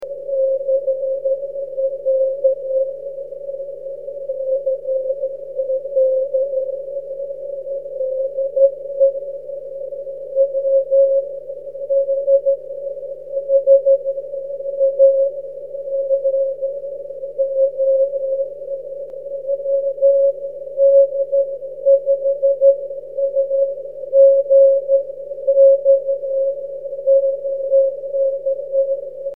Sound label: cw; 23cm